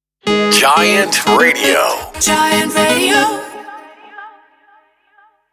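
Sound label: Sung Jingle News, 120bpm C minor, Outro; Giant Radio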